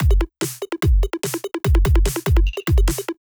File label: DUMB BEAT (146 bpm)